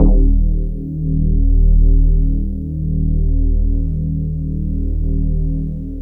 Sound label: E-HARP, DX HARP; Wizoo - Powered DX